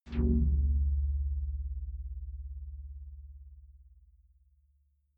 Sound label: sci-fi critical bell clean deep